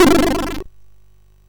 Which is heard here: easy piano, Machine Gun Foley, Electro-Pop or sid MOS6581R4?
sid MOS6581R4